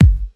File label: round bass drum single hit g sharp key